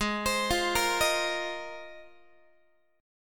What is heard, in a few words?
G sharp 6th Add 9th G sharp Guitar; arpeggiated; JGuitar